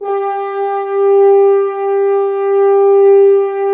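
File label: BRS, French Horns Dry f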